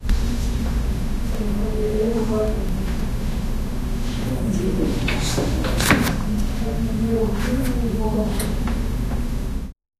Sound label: ambient classroom sound